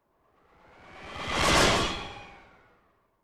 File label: Whoosh Sci Fi Space Vehicle Small Fast, Whoosh Space Vehicle Small Fast Spacecraft; Spacecrafts; BBFX